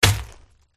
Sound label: archer attack contact middle